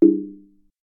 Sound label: bell